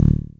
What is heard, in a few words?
bass D; CLARITY